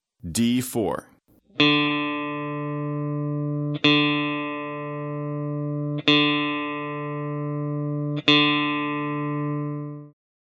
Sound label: D string, guitar